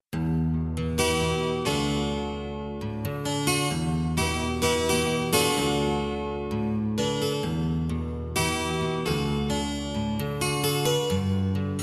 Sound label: spa music 432 Hz; letni las